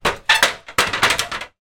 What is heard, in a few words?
household step ladder movement; zapsplat